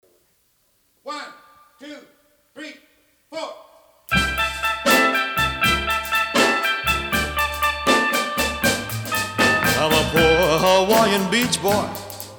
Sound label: Blues